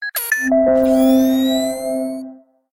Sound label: Robot, notifications; Galaxy S9